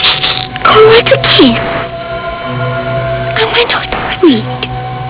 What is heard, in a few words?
kiss